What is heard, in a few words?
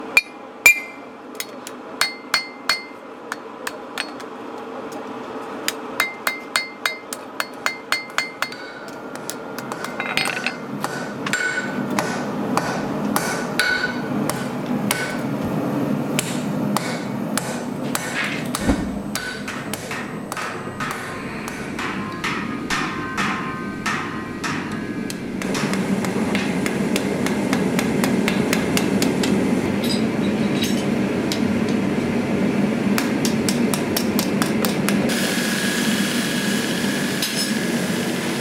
Blacksmith